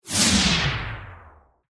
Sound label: Whoosh Flange, Whoosh, Flange, Phase, Descend; Production Element, Imaging Element, Accent, Transition; BlastWave